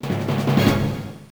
Game Over; Breakers; arcade; Neo Geo

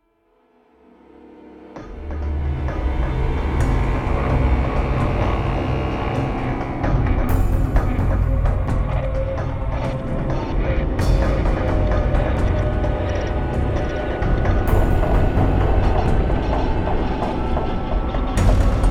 Music Action Scary; starcraft 2 wings of liberty; MissionMusic

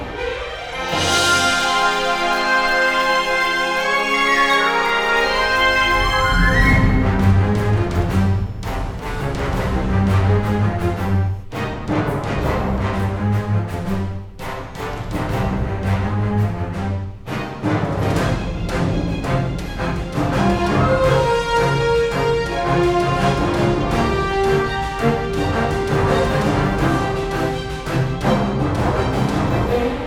Login Scene Loop

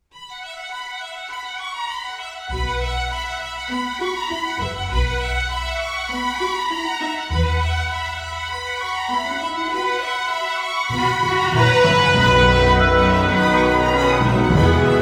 Victory Fanfare; melancholy republic original soundtrack 2017

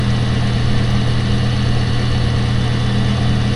ambient fan

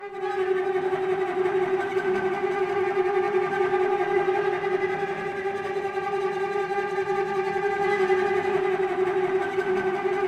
Jungle Suspense Strings; Far Cry

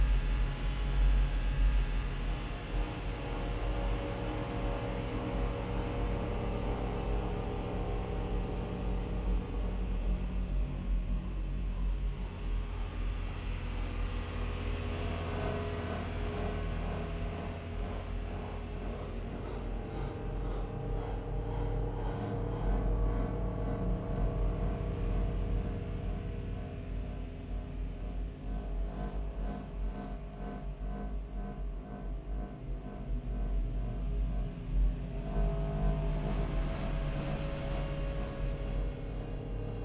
drone

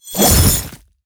Big Chain; helltaker; Boss Big Chain Show

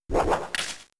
Horn Attack; pokemon; gen 3; attack moves

